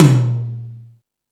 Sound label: Ambient Rock, drum machine